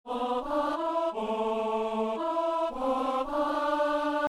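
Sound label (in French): psaume, ténor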